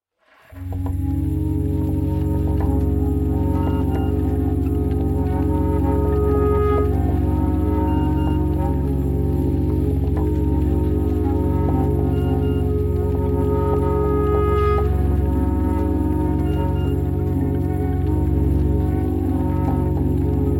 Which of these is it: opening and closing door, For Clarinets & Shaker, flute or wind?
For Clarinets & Shaker